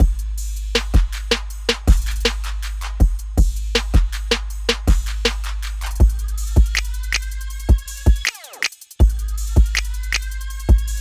Beat

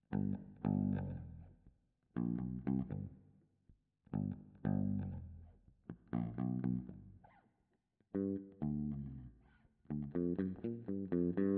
bass

